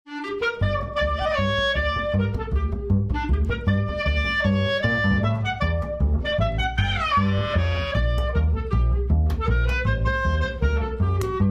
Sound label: unplugged Duo